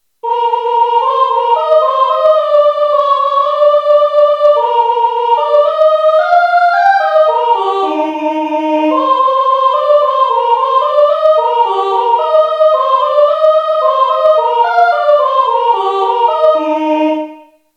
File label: fanfare soprani; (midi); Fanfare du printemps 3